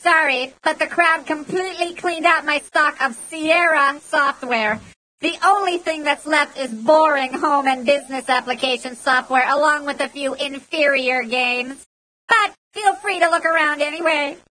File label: Crowd; Software Excess Clerk; Crowd Cleaned Out